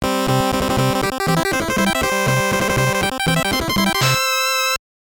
Batter up; Bases Loaded 2; nes

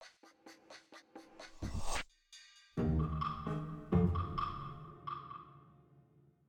Field Battle Minus Easy

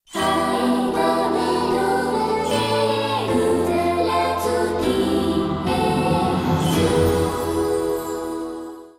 Waltz; stella glow original sound version

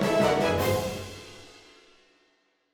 Music Fight Serious Theme Bpm160 End; asterix obelix slap them all 2

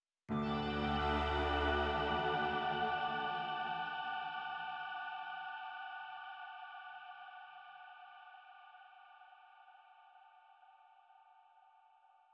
Forest Music, Lullaby D Major Stinger D

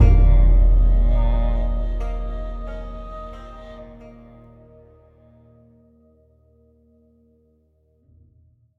Home Finally Calm Stop 60bpm; project zomboid

